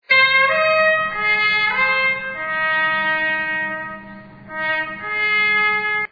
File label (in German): zapfenstreich